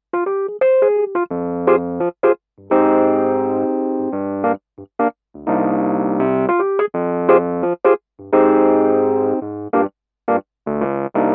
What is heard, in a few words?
Kurzweil Forte Rhodes 73